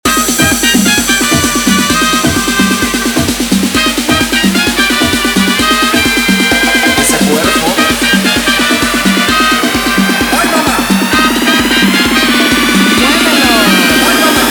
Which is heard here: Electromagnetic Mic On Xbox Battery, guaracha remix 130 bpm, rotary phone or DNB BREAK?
guaracha remix 130 bpm